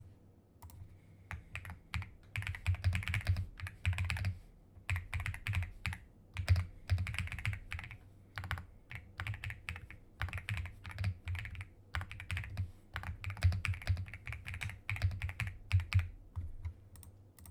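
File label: keyboard; rapoo v700diy 75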